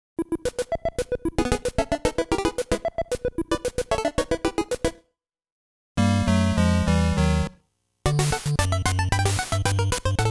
Game C Start ~ Game Over; Game Sound Museum Famicom; Kousenshou Series; Wild Gunman; Duck Hunt; Hogan's Alley

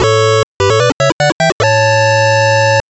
BGM Fanfare

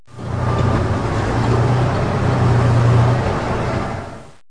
mission APC

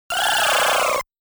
Credit Sound; Genpei Touma Den

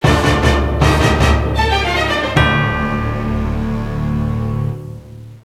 Fanfare Failure; Yu-Gi-Oh! World Championship 2007; DS

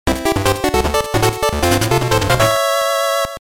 Match Start; mario tennis; gamboy color

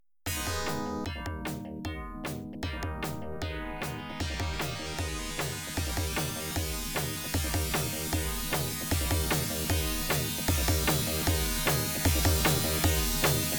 Catwalk Timer, pinball

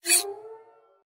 Robotic Interface Sound

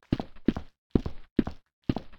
stone walk

